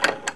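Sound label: container locked; The Elder Scrolls III - Morrowind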